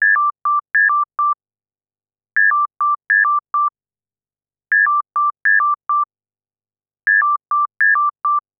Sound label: Locust Dialog; Nokia; PlusFourSix; Lars Edvall